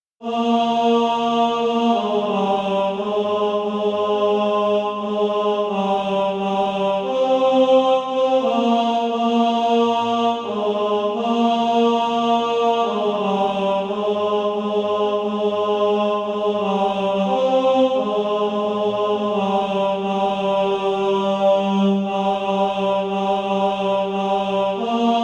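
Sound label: tenor, SATB Practise Music; Deep River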